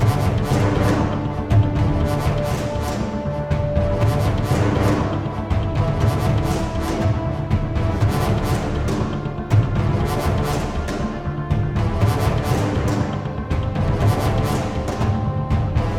Music Battle Boss; Spiral Episode 1

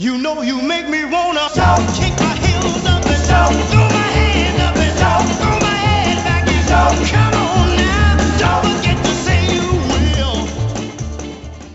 Ditty; NBA ShootOut 2000; PS1

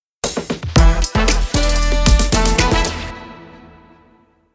Battle Hub Tournament - Win; Street Fighter 6 Beta; Xbox Series X/S

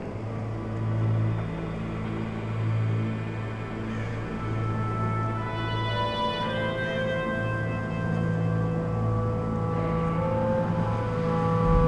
Level Darkwood SFX Infected; Dungeon Hunter 2

